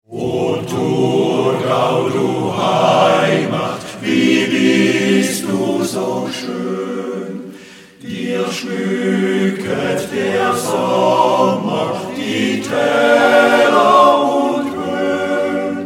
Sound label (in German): Klingelton Thurgauerlied; Android